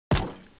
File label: blast rifle blaster gun shot sound